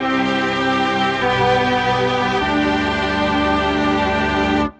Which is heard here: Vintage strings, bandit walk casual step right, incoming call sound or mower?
Vintage strings